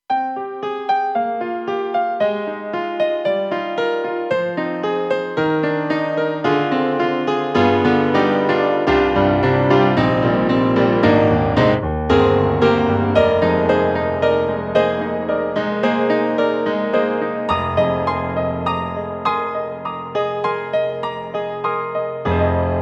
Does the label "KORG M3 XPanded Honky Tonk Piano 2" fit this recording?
Yes